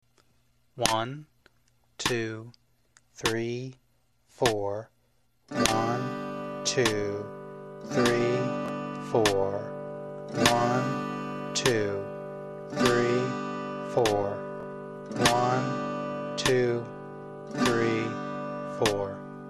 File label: rhythm extra, guitar, play downstrokes only on the "1" and the "3" beats; Start over at 50 BPM